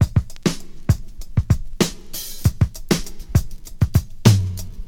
99 bpm breakbeat C# key, Free breakbeat sample - kick tuned to the C# note